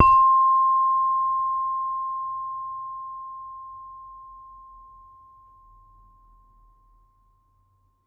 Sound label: Saron B, Gamelan